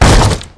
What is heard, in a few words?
drop box